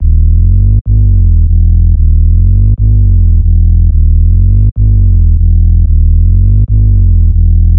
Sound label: tech house bass - em - 123; instruments; loops